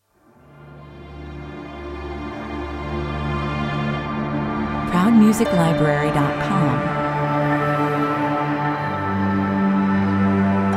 67 bpm

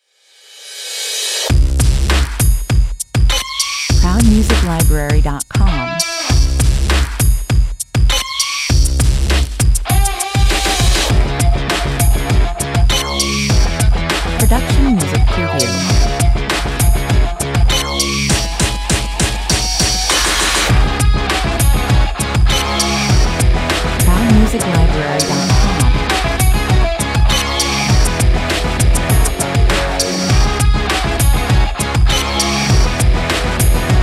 urban big beat

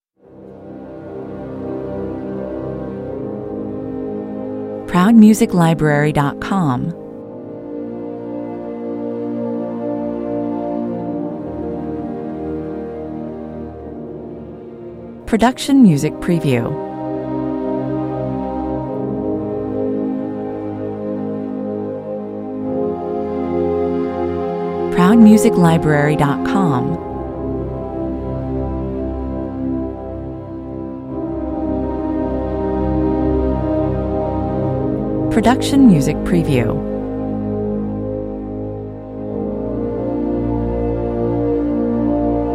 strings only

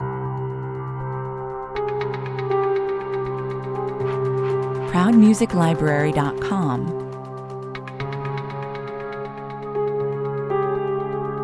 darkness lurking (slow) 120 bpm

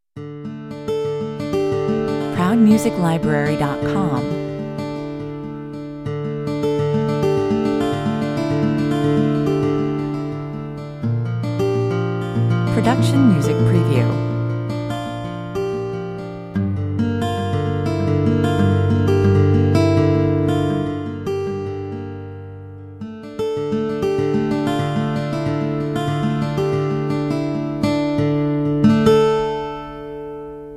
acoustic guitar

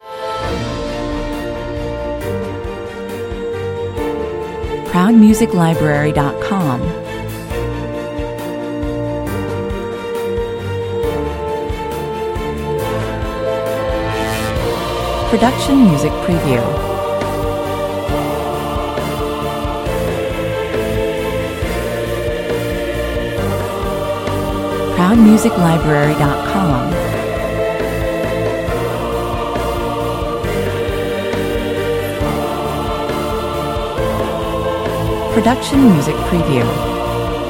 with choir and solo voice

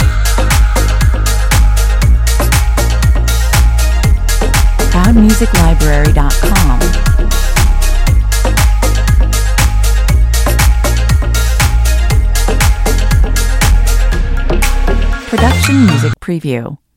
tropical summer loop

